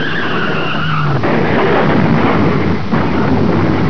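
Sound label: car crash; Titanic came to a halt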